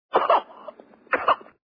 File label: HUMAN BABY FEEDING